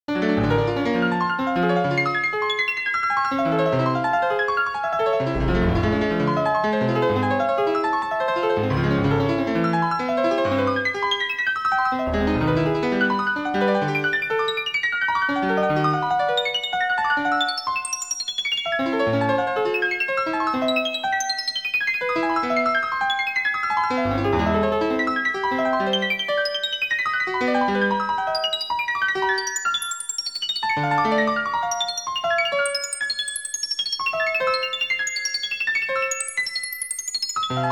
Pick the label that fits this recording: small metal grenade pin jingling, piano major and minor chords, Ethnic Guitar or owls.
piano major and minor chords